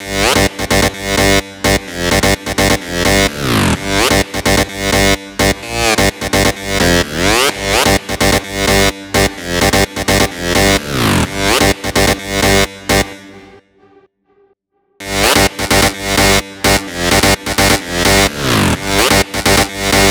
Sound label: 128BPM Drop Leads SC, Synth, Root G; Vengeance; Essential Clubsounds Vol.5; Demo Constr Kits; Blue Eye Kit